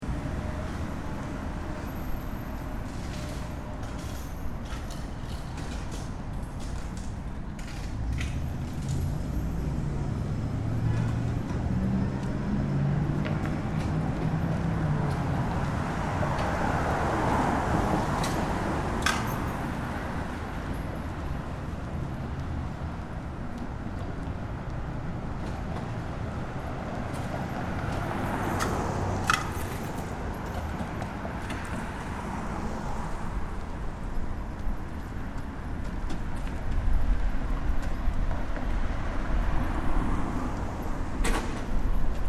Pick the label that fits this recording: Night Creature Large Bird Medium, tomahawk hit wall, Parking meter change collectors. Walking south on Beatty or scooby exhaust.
Parking meter change collectors. Walking south on Beatty